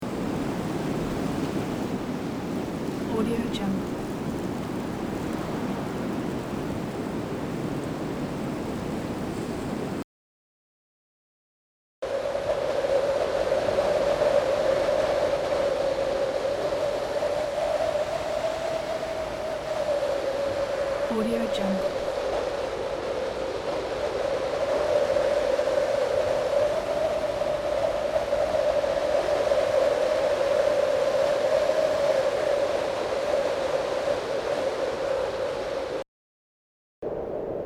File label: wind ambient loop